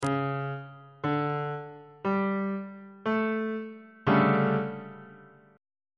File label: d major 7th suspended 4th 3rd inversion on bass clef